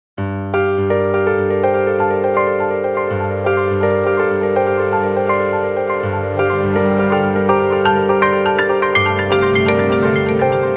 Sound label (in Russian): originalnye ringtony i uvedomleniia nokia asha 302